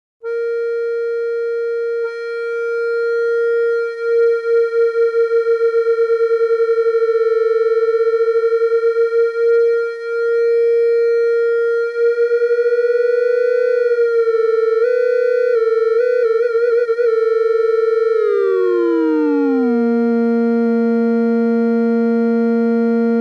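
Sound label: d continuous pitch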